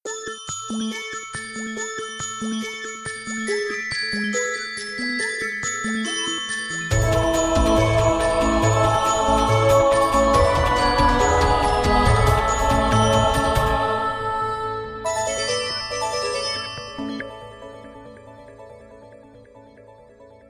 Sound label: Clarion Call